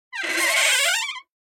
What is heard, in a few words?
Door - Wood Creak Sharp; Hollywood Edge; sound designer's toolkit v2